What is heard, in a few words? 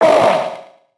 butcher pain; Fight Knight